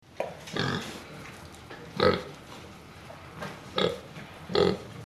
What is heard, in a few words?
pig